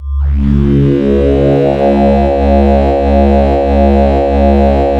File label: C#, Synclav FM; Big Fish Audio; SPACE1